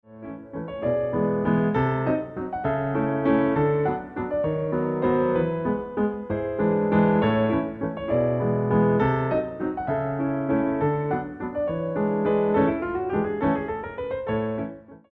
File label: Grand allegro medium, music for ballet class